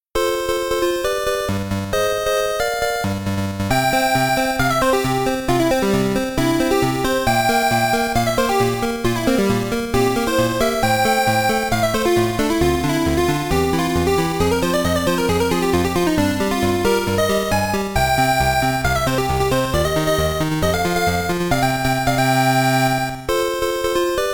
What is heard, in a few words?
BGM; Fourth Stage - Reverse Volcano